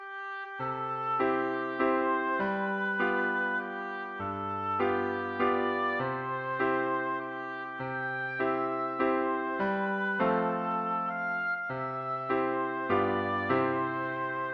happy birthday, oboe

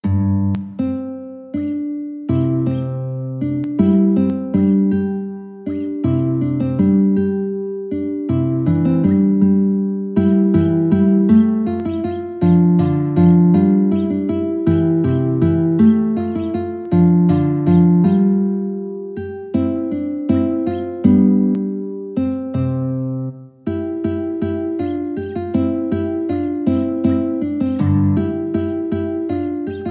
water music, guitar

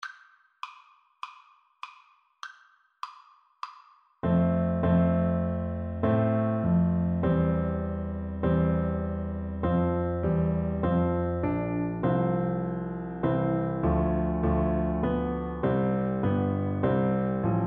o come, Tuba

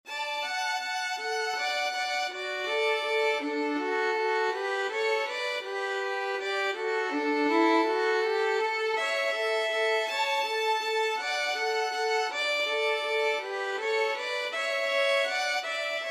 daisy violin duet

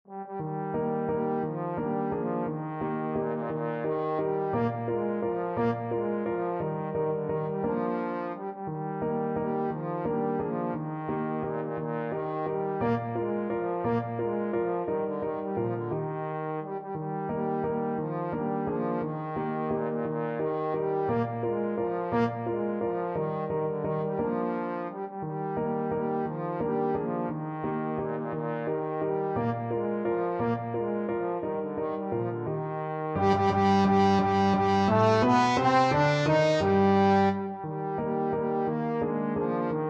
german dance trombone

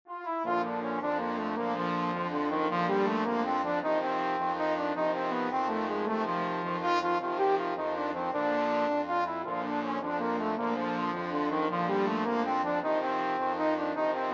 trombone quartet

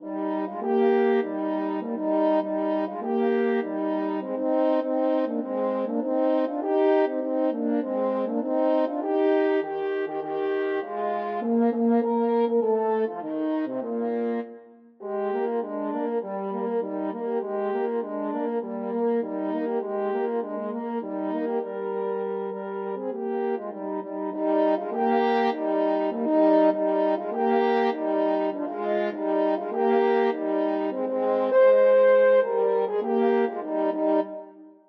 french horn duet

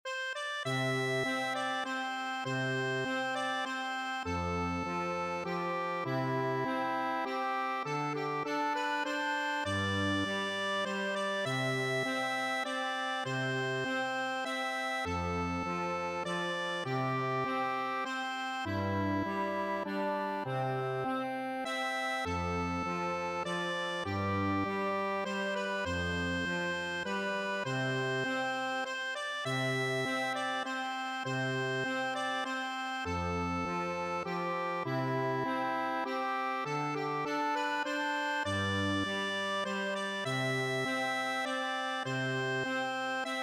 accordion

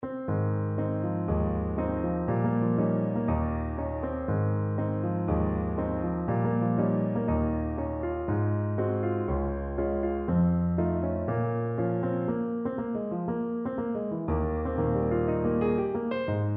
narcissus piano

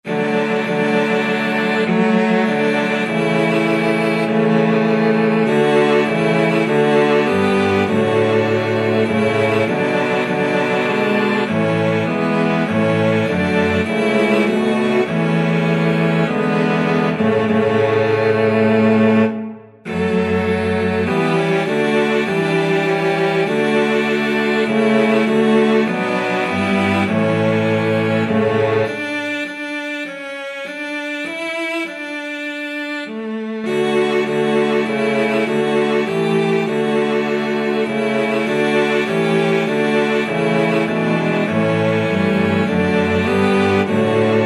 cello quartet

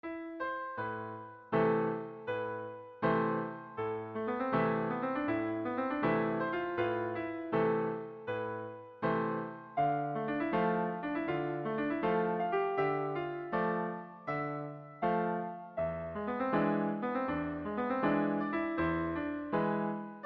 oboe

